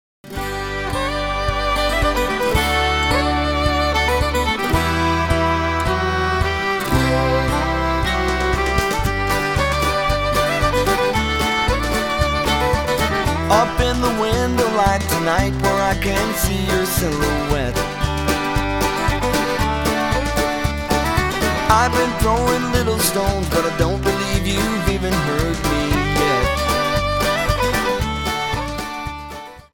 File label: Fiddlin